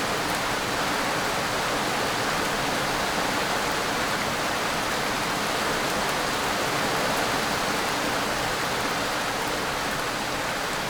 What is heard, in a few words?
rain; q3rally; CANYON CRUISE Map